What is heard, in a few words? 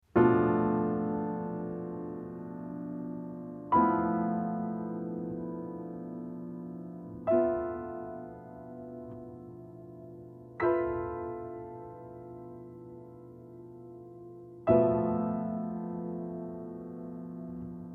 Bmin7b5